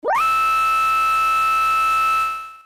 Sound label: scream; terra; ff3; ff3us